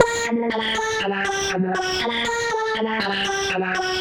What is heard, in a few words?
synth arp; Electrocution